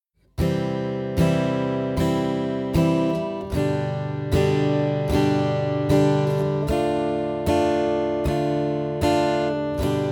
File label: Chord Progression Example, guitar